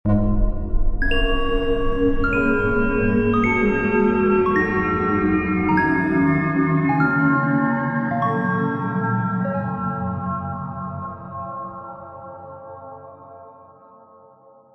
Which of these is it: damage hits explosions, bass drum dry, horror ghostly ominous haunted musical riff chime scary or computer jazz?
horror ghostly ominous haunted musical riff chime scary